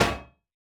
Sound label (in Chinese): 沉重核心破坏声